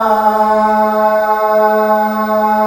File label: MALE AH A 2, SOLO, Africa; Spectrasonics; Heart of Africa 1